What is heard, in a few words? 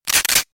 Pump Shotgun